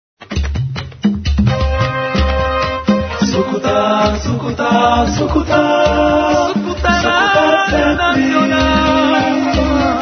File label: interval signal; radio nacional